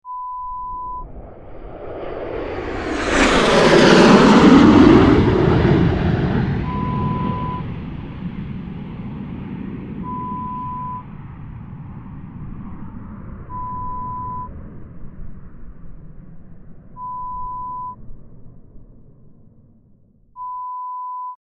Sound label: Fighter Jet sound